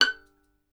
STR VLN, Solo Instruments; Miroslav Vitous

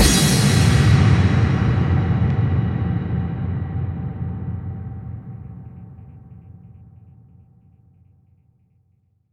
bassdrums FX, FX kicks; Vengeance - Essential Clubsounds Vol.2

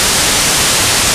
white noise C3; Big Fish Audio; Synth City

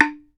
BAMBOO, World Assortment

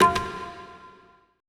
TUNEDRM F1 L; BPC DRUMS

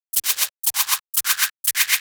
Percussion, Trance NRG, Drums

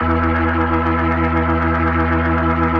E2, B3

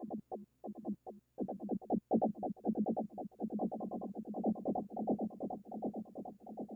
synth FX, Cmin; Big Fish Audio - Hardcore Breakbeat Action; Method Machine 142 Cmin

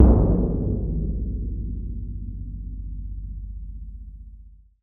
timp; musicradar; cartoon caper

